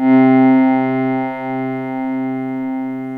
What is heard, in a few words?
Pad, FM; Middag; Polyend